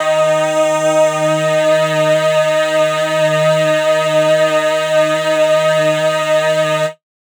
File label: Riddim Melodic Hit D# Synth Worship; One-Shots; Ghosthack; Riddim Essentials Volume 2